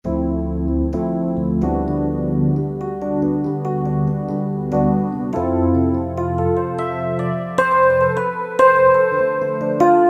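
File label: Percussive E-Piano; Roland PN-D50-04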